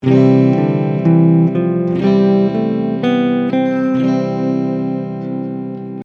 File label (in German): Ionisch; C Major Scale piano